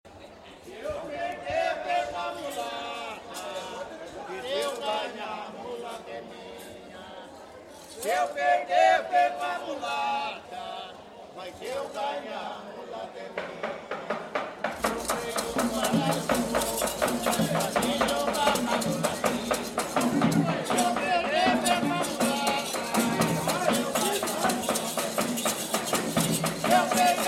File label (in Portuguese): batuque de tiete